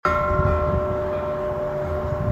eyesing sound

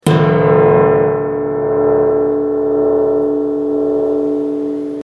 konggong

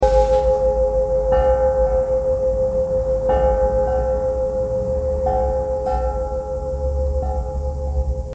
boxbell

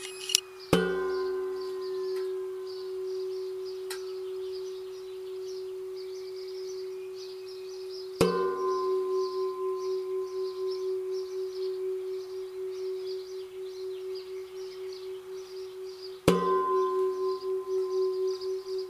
moon sound

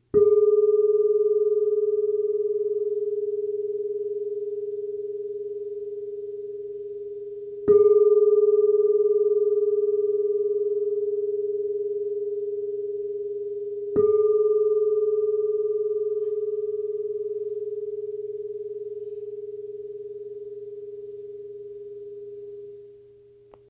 one long song

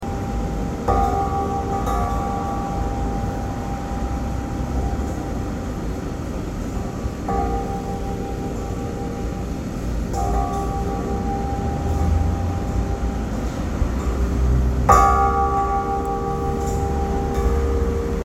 belfry sound

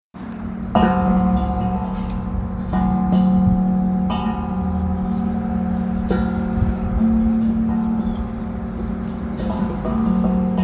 triad

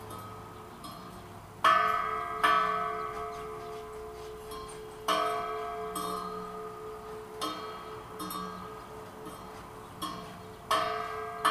halfpipe

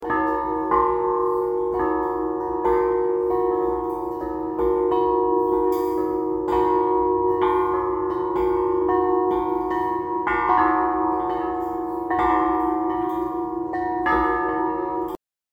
hells bells audio

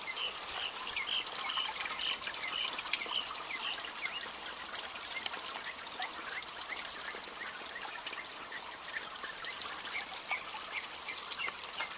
stretch

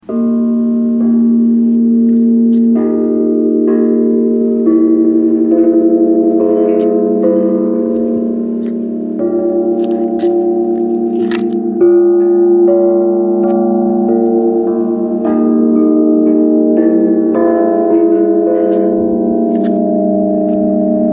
bellflower sound

